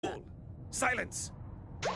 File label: Silence